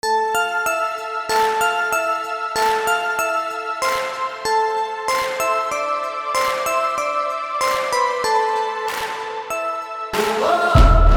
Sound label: noise